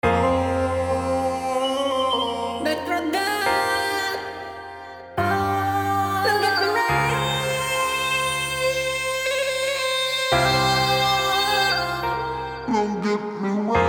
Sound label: autotune beat